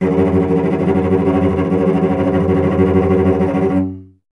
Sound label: STR VC F#1, Cello; Miroslav Vitous; Solo Instruments VOL-1